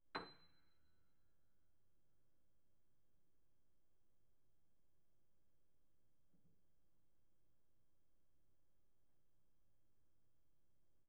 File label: Piano mf C8